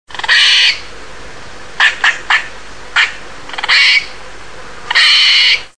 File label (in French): ecureuil cri, mammiferes; parcours santé